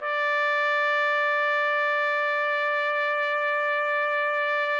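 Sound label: trumpet; Roland - LA Composer vol. 3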